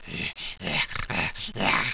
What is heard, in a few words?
growl; animal